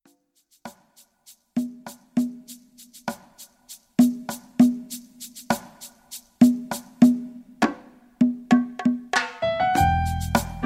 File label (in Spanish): Bolero Cubano, ritmos, tropical